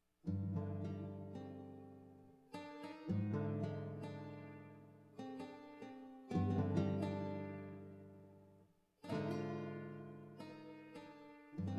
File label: flamenco